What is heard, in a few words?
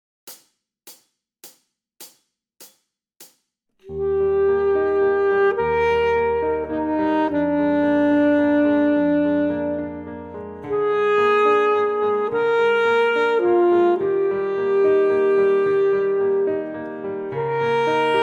Andante Full Sax